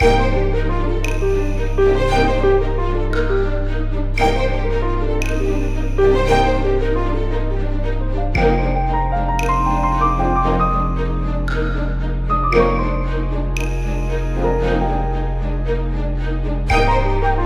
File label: Loop; Lost Epic